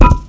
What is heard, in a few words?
concrete block impact hard; physics